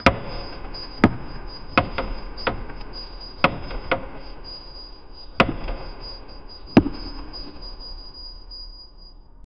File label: loud fast hammer strikes; wood cracking